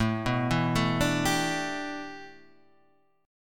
A 7th Suspended 2nd Suspended 4th Guitar Half Step Down